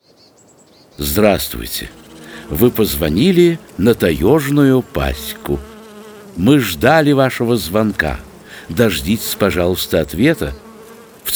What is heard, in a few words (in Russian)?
Музыкальное приветствие на телефон; Таежная Пасека